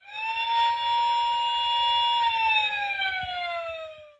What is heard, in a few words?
Fire Truck